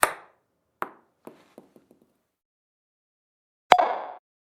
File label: ping pong hit distortion; SFX